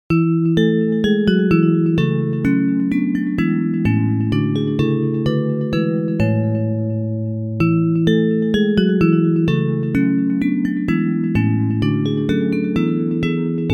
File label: Bells, Traditional hymn